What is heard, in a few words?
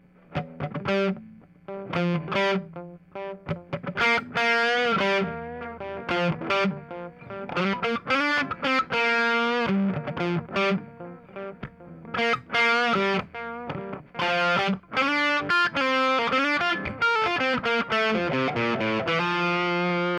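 electric guitar delay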